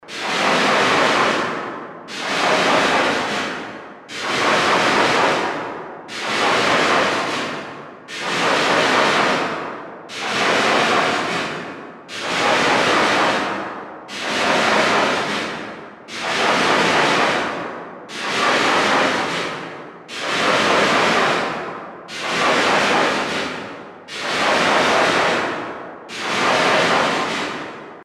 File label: crackle koto